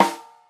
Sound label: snare drum sample a sharp key